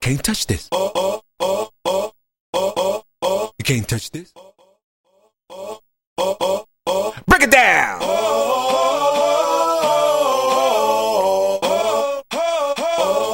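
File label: 90s Mainstream Acapella In Party Break Re-Drum; Mainstream VIP; Vegas Edit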